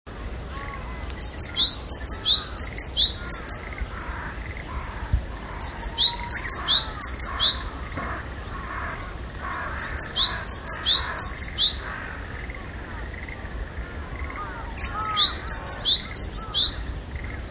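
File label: sijukara, Japanese tit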